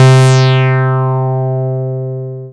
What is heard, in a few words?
Dance Floor Bass, Multisample Basses; Best Service